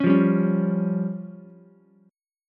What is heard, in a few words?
C sharp Major Flat 5th, Ukulele, C#Mb5 chord; C#Mb5 strummed; JGuitar